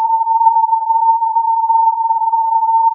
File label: filtered input